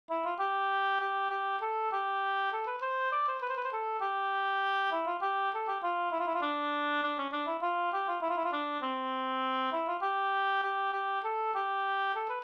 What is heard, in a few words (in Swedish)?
snoa, C dur